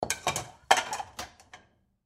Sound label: Dishwasher Bowl, Dishwasher Place Bowl In Rack; Plastic; Whirlpool Appliance; Household; Blastwave